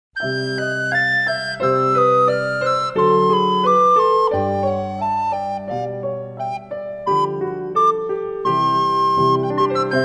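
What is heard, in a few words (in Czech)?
zobcová flétna, klavírní doprovod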